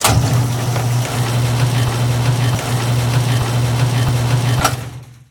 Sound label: lifting